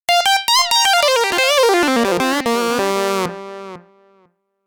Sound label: Roland Solo